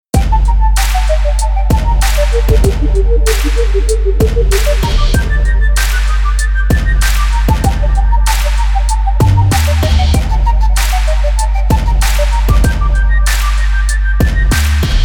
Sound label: ocarina; soul samples